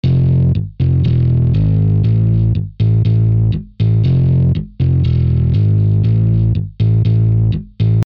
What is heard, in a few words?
dry